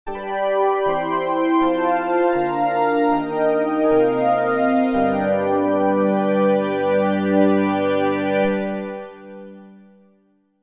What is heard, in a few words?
Yamaha Genos2 FM CS Pad